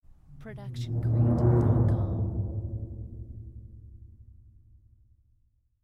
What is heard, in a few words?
stringed drum